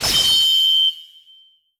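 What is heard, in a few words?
Score Battle Finish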